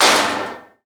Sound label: hit metal box; GTLive